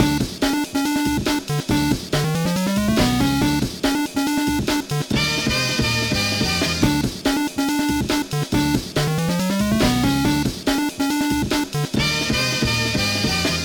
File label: untuned